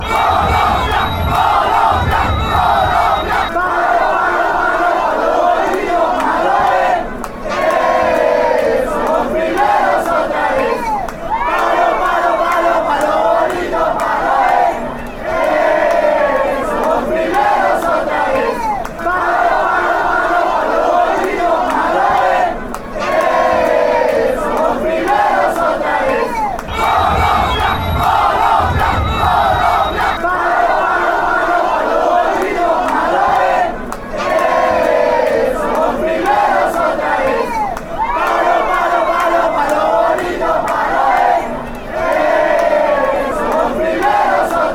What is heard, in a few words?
world cup chants colombia